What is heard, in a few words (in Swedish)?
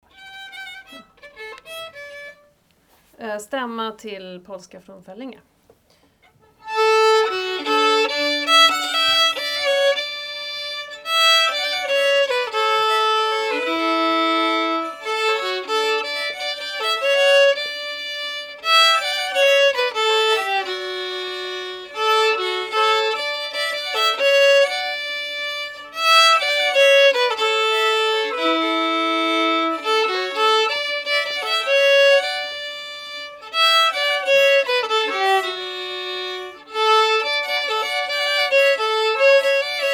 Stämma Polska från Föllinge